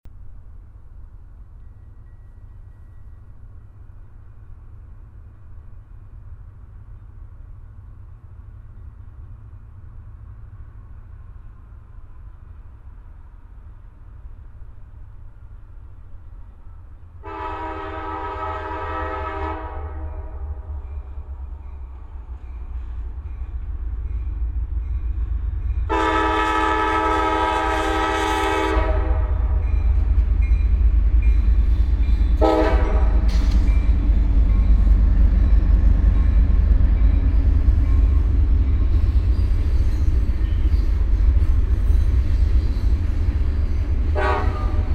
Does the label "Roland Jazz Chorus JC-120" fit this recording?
No